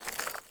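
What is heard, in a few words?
ui pickup charcoal; Rust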